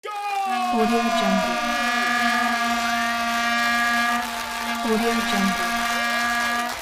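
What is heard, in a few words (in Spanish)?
gooooool bocina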